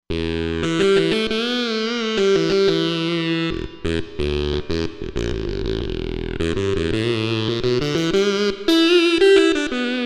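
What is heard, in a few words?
Talk, synth lead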